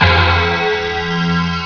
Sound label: Beat; Bip Boing & autres